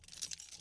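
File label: Inspect Shell Movement; Valorant; odin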